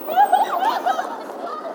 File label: Thing Call